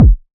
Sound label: sharp kick sample f sharp key; kick drum samples; one shots